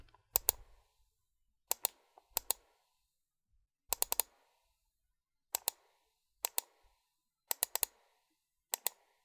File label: mouse clicks, Mouse clicks recorded with a Sterling ST66 mic, nearby.